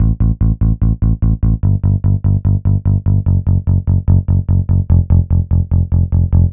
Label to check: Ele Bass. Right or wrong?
Right